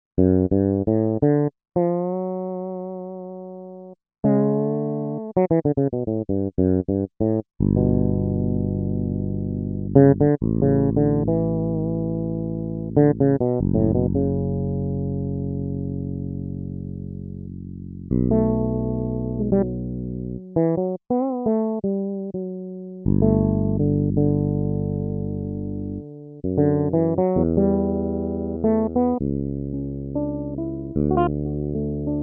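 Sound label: Bass Fretless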